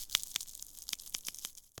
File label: ice; ice age scrats nutty adventure; Level1 Ice Cliffs